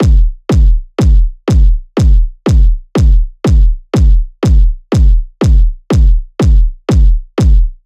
kick 122, Kick Loops; drum sample pack; Ioto; SEISMIC; Slate

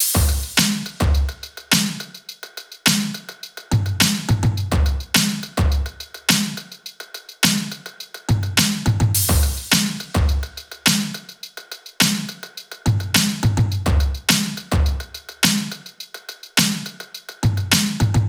top 105, Drum Loops; SD MIDNIGHT Sample Pack; Slate

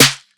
snare rattle; one shots; SEISMIC Drum Sample Pack; Slate

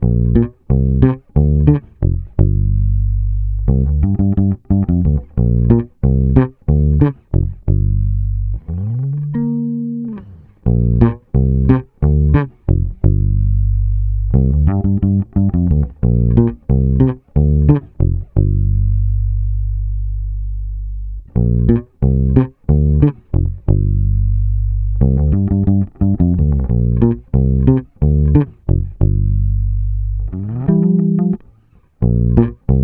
Bass loop 92 Gm, Electric Bass Loops Direct; Turtle; Slate Digital BASSHOLE Sample Pack

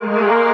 vocal grand Ab; SD AURORA Sample Pack; Slate

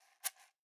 shaker single hit; Slate; SEISMIC Drum Sample Pack

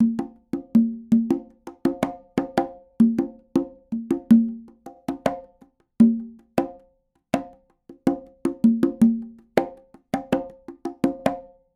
afroconga loop 80; perc construction loops; SEISMIC Drum Sample Pack; Slate

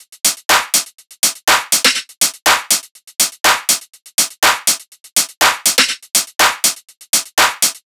tops 122, Drum Sample Pack Loops Top Loops; SD SEISMIC; Slate

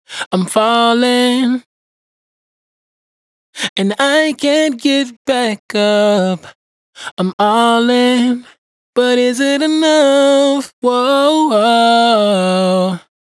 Dry Vocals hook Is it Enough 140 F#; Raw Vocals; Slate Digital Omnivox Vocal Sample Pack

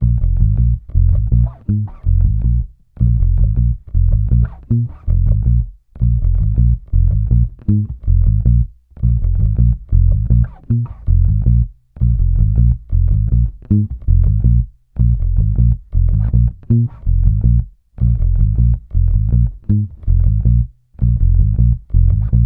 Bass loop 80 Bbm, Electric Bass Loops Direct; Slate Digital BASSHOLE Sample Pack

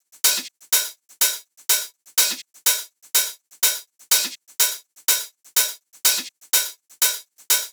hats 124, Hat Loops; drum sample pack; Acamarachi; SEISMIC; Slate

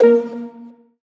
tonal oneshot Bb; Pop; Slate; PASADENA Pop Sample Pack; Tonal One Shots

